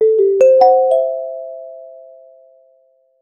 a short distinct pessimis, digital

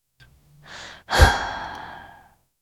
a sharp anxious inhale

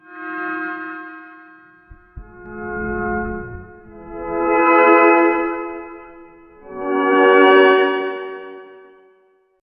the sound of nyckelharpa, stringed instrument

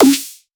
punchy modern trap drill, hip hop

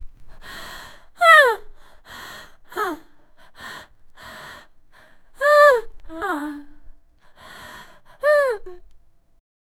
young scared woman graspi

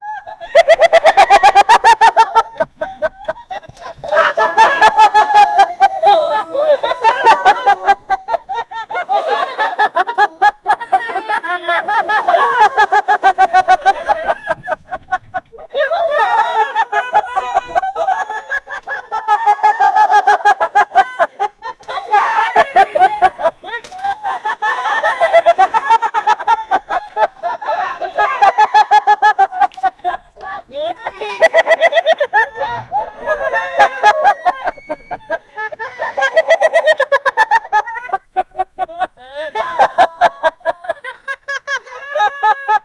two tanzanians, laughter; poach

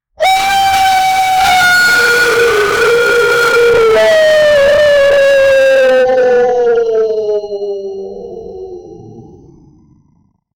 an alerted sound of some, beast

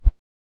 the sound the air makes

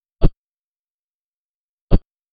small item drop soft; object drop

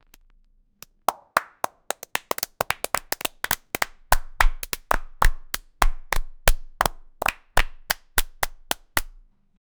ambient clap

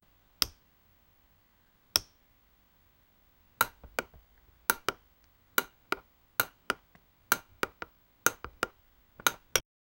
button sound for fashion; doll

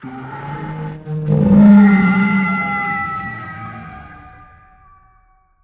animatronic bull jump

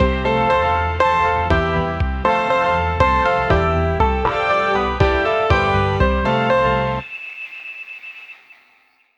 radio jingle, advertising

